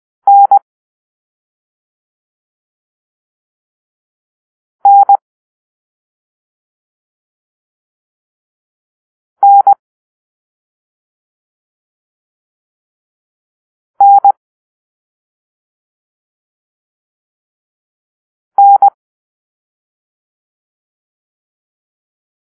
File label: November (N), morse code